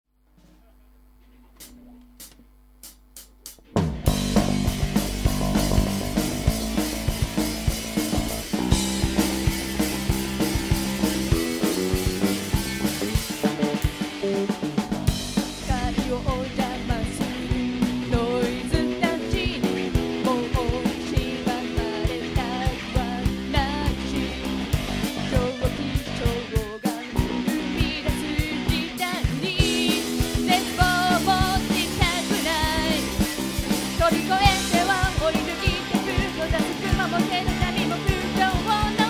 glide

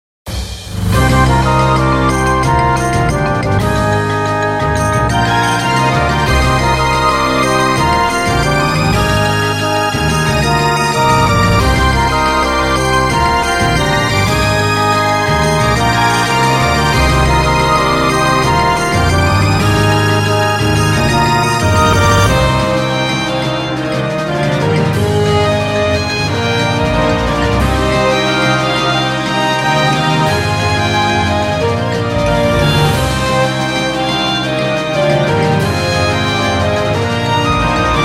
Battle

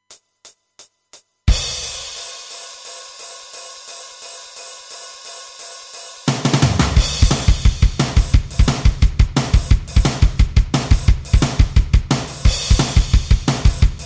beta drum

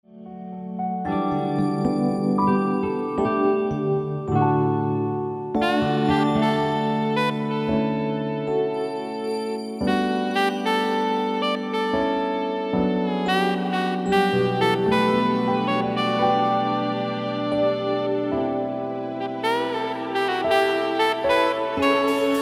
pop

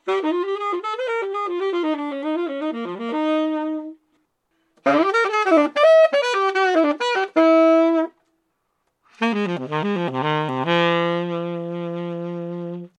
tenor; DV NY 7star

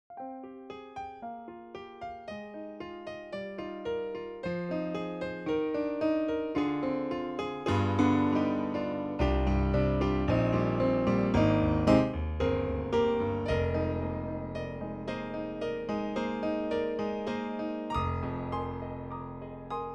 piano; stein-hs2

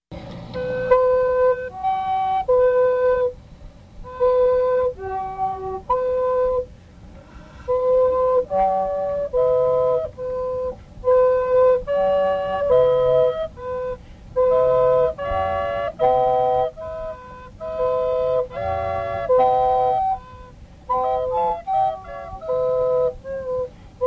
Fue Pianica; LYC 1983

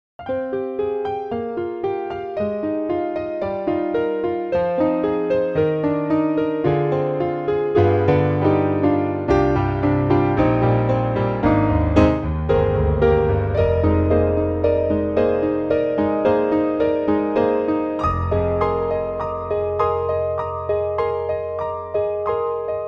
piano; refx; nexus

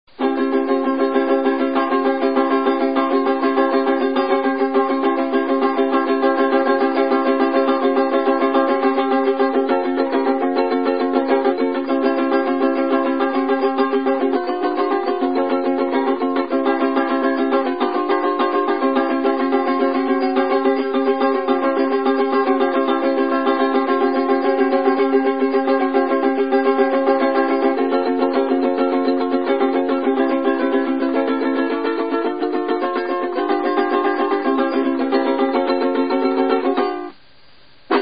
blues

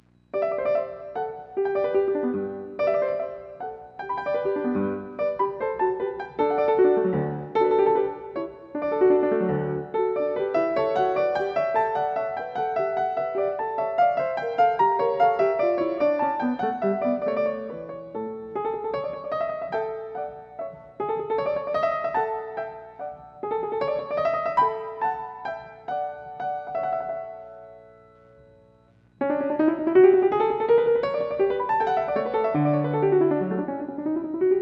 Scarlatti Sonata G dur

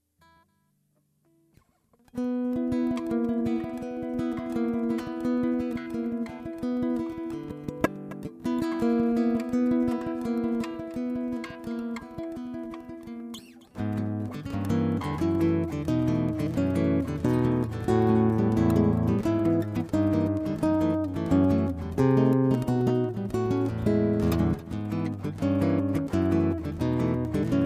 g solo

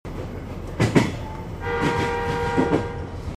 klaxon

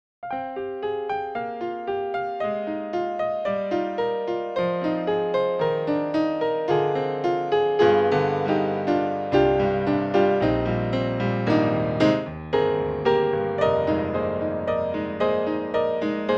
piano; BS-1

